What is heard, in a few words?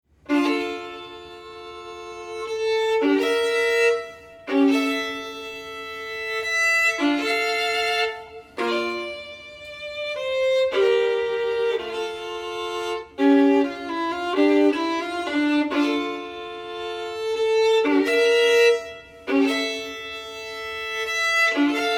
chaconne from partita in d minor for solo violin; johann sebastian bach